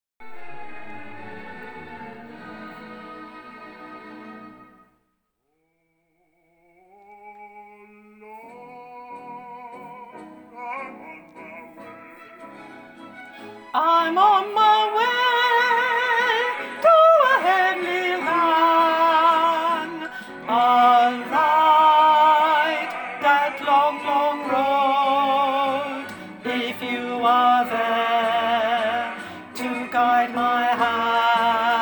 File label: alto